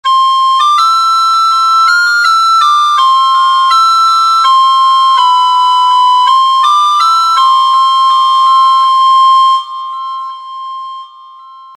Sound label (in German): melodie